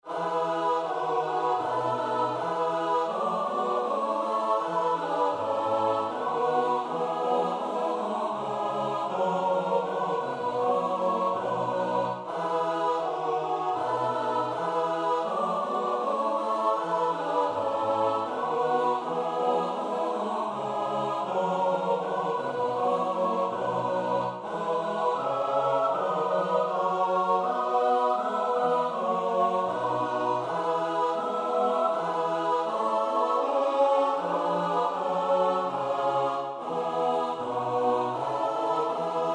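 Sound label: soprano alto tenor bass; Bach (Johann Sebastiaan); BWV 086; Der Hoffnung wart’ der rechten Zeit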